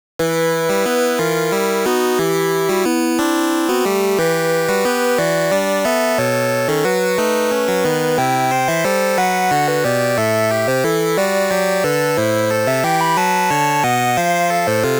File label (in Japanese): フィールド3